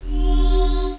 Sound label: Mega Crust